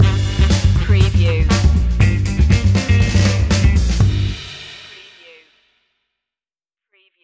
funky baddy stinger

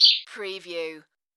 Sparrow

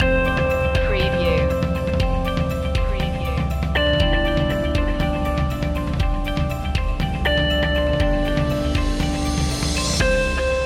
corporate innovations

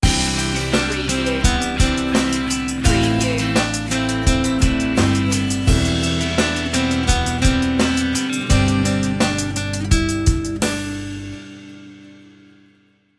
sting

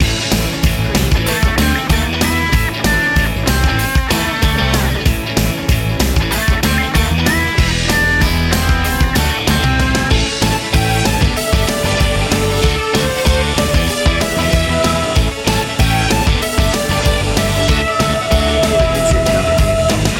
energetic indie rock loop

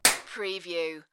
Face Slap

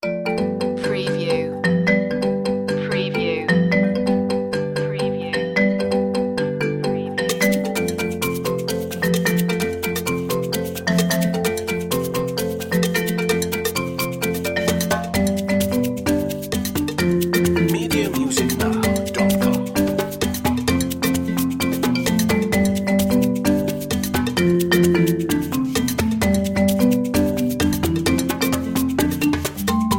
energetic africa

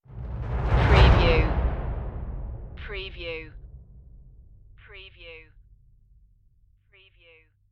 cinematic emotion stinger

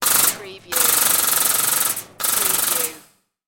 Carnival BB Gun Shoot

